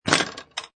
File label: Pinball Sound Rattle And Clank; Video Games